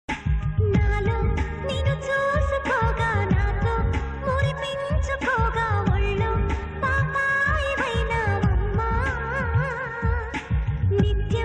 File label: song ringtone bgm